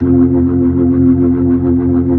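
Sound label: B-3, Hammond B-3 organ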